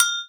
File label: Agogo Hi Open; Planet Earth; Digital Sound Factory Planet Earth